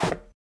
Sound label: jump; Starter-Kit-FPS